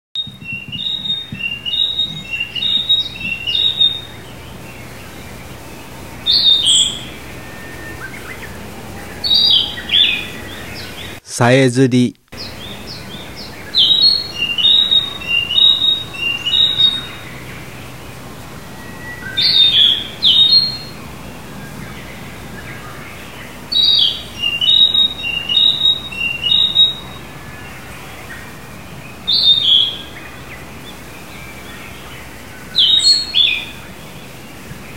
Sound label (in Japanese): kibitaki; bird; azamidani